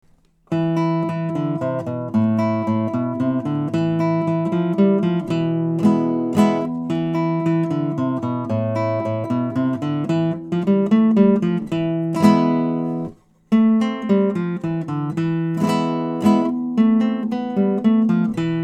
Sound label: flamenco pick